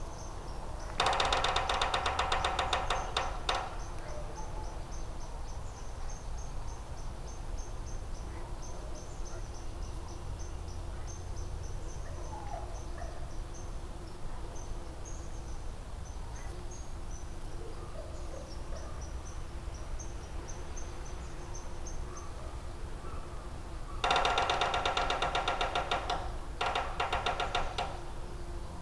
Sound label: Red breasted Sapsucker Sphyrapicus ruber